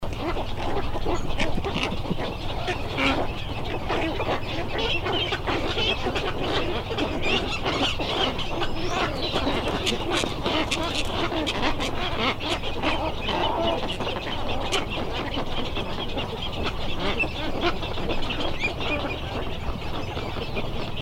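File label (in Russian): egipetskaya caplya golos, cattle egret voice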